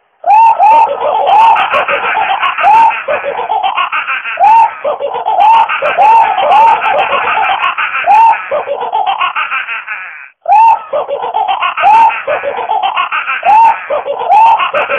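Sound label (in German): böses Lachen, Klingelton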